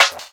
One Shot Snare, Drum N Bass - Percussion

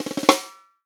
snare drum, HIPHOP SNARE CHROMATIC; ProSamples vol.43 - Real Drum Kits

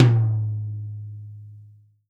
tom, Real Drum Kit, funk kit

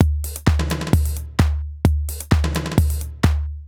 Alesis HR-16B (130BPM), beats

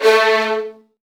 Virtuoso Strings

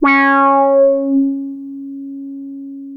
C#5, Boom da Bass; E-MU Producer Series Vol. 6 – Analogia Project (CD 1)

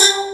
SYN MOUTH, BASSES; Pro-Rec - Synthsonic

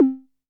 perc, Novation Bass Station 2

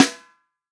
FUNK SNARE CHROMATIC, REAL DRUMS

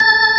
ORG, Organs

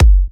F# kicks; markus hakala kicks

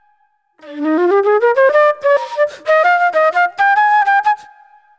NY 70's Jazz Flute

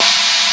CHINA CYMBAL, Ensoniq ZR-76; 300 Drum Machines

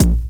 DR. LOOP; X-Static Goldmine 3

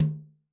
Brsh Jz Md, Tom, Yamaha DTXpress-II

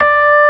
ORG. D5; B3 FULL

